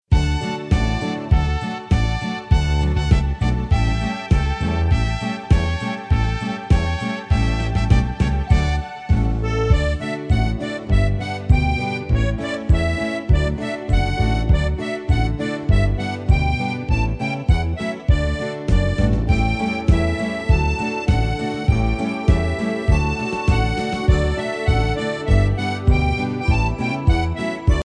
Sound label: los animales instr; plural